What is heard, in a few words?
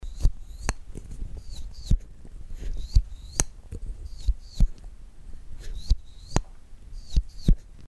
Thrill action